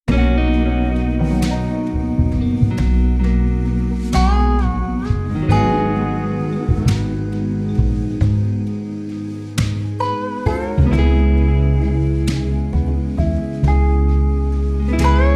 Mellow Ambience

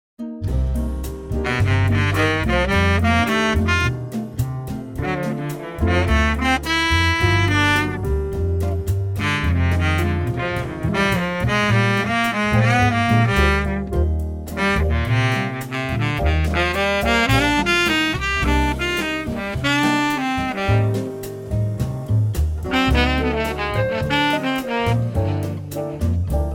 Jazz On The Saxophone

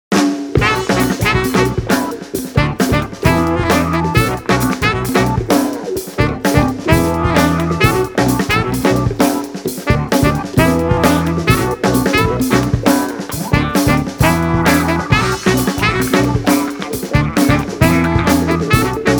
Funky

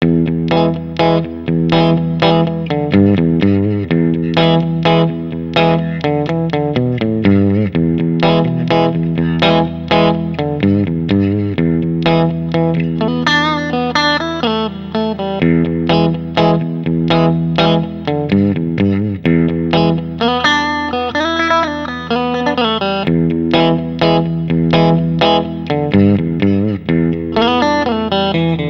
Bluesy Muse

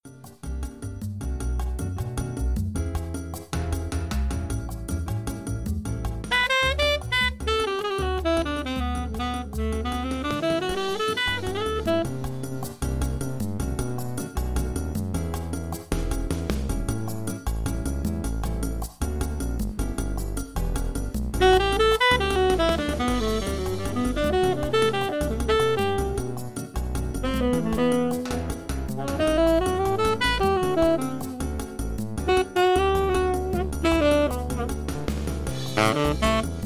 Songo Jazz

